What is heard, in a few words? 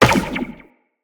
Run Zipline, Footsteps; Tenno